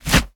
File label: right punch